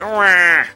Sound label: waaaah